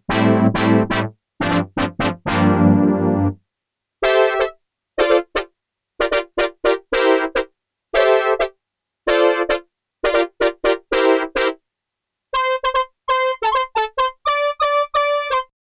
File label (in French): FM DX7 Cuivres, synth; cuivres de synthé analogique pour jouer des pêches